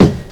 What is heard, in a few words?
Kick